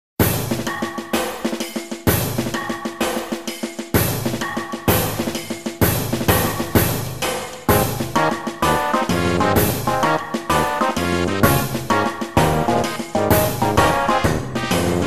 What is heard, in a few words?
Luigi's Engine Room Music